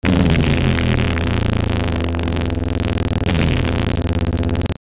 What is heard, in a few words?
static glitch; Static Glitch Hum Long; Production Element Imaging Element Accent Transition; Blastwave; Static Sound Effects